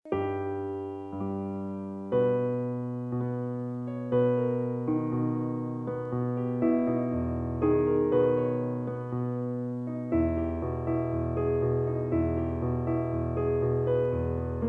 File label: Piano